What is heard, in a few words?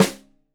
Rim Shot Stereo, drums; Deeply Sampled Drums